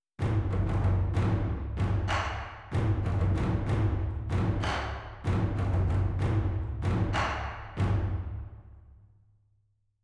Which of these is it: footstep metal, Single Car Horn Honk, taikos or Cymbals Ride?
taikos